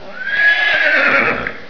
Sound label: horse